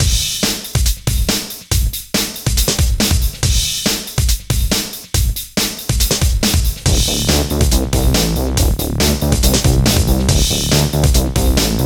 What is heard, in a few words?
birdwalk